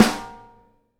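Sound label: snare bottom DAMP, Live Snares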